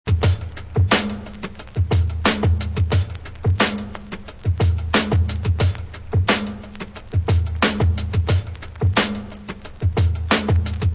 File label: drum loops